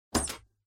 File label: TV Turn Off Dry